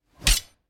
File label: Knife Pull; soundscrate